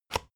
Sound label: spring binder unlock